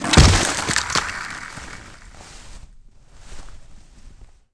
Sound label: bail knee, skater; Tony Hawk's Project 8; XBOX